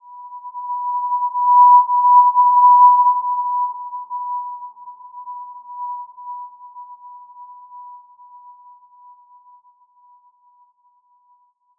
Simple Glow B5 f; HSS-Custom-1; healing soundscapes

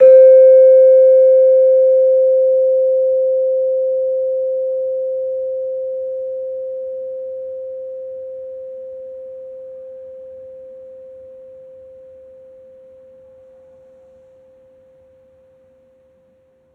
Gender C4 f, Gamelan; healing soundscapes

